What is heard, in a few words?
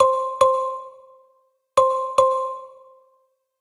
call